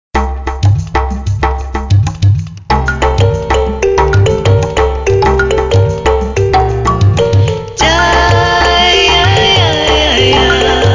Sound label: Sensual; Alcatel Elle GlamPhone N1